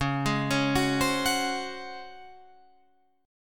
C sharp Major 7th Suspended 4th C sharp Guitar Drop D